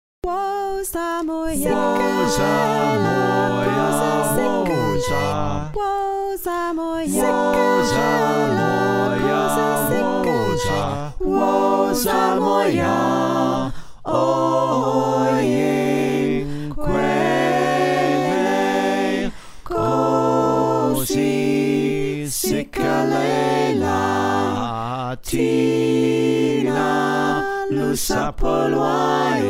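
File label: soprano alto tenor bass, choir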